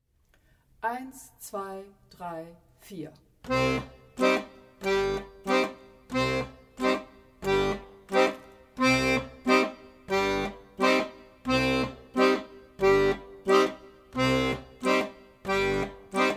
wechselbassuebungen mit dem moll akkord

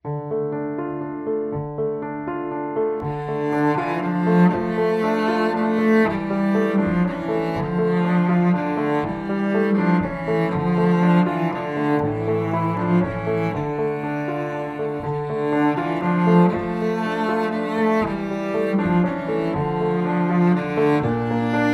Ballade

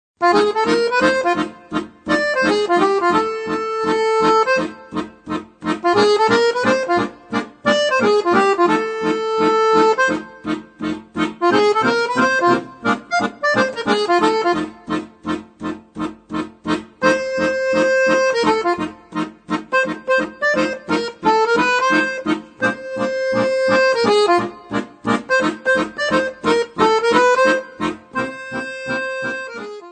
blues for m bluesschema chromatische tonleiter

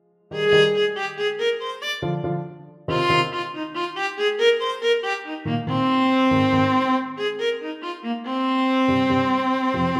Tanz der Slowaken; b bartok

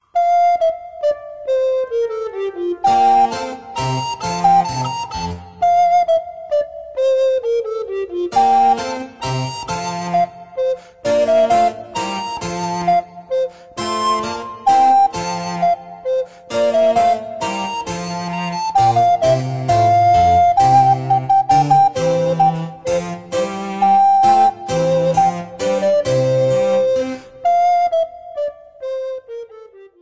Menuett

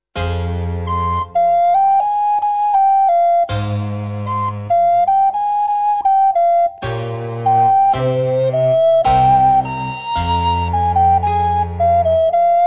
sonate in f moll

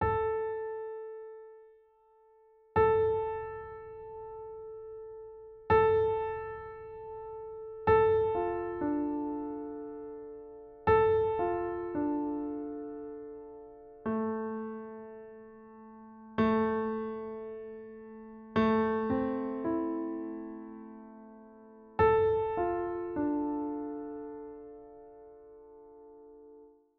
stimmtoene

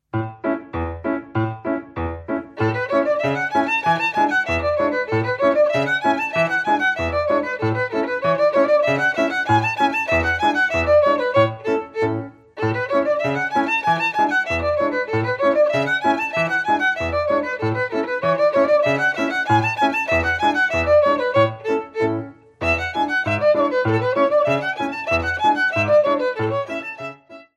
scale reel hoerversion

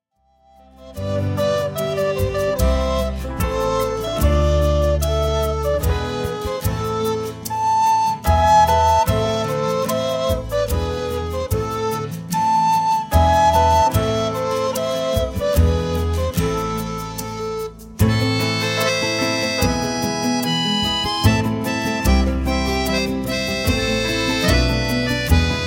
schwedisches volkslied

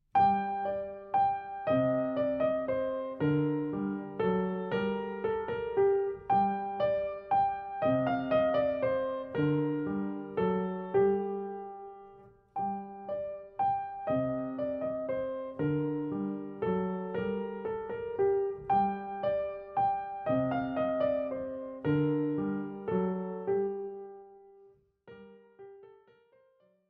kleines menuett

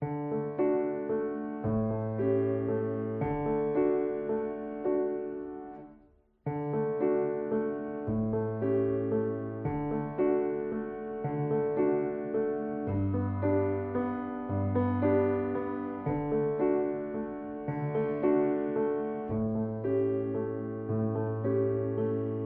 wiegenlied playalong

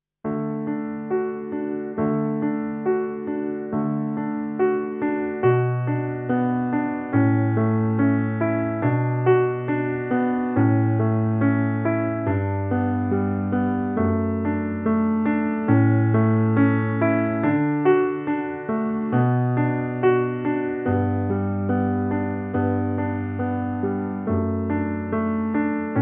kleine improvisation

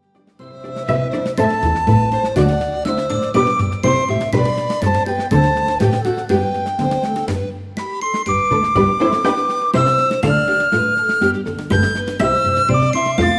Marsch

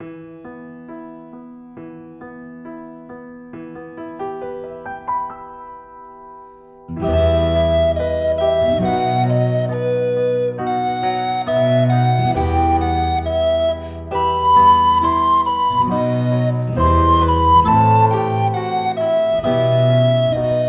Moll Minis

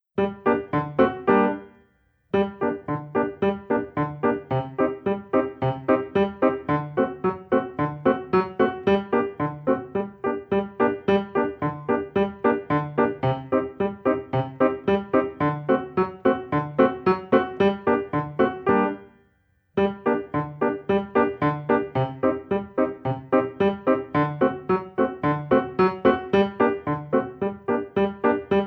zigeunergeige

